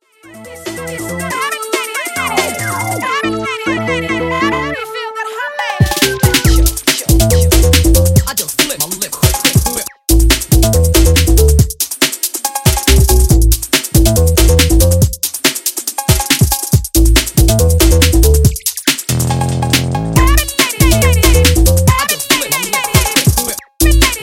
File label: SWING